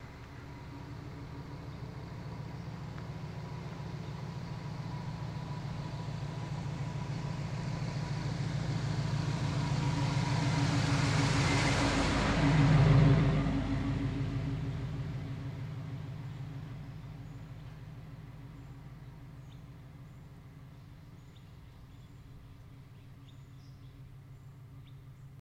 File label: Blazer Drives By, chevy blazer